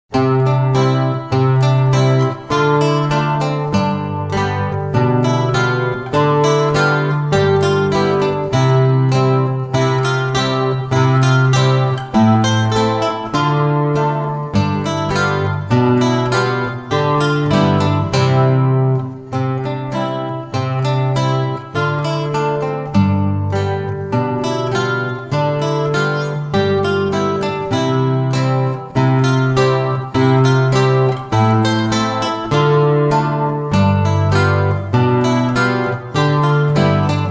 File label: intermezzo, Gitarre; klassisch